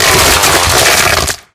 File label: chainsaw gore; npc; melee; infected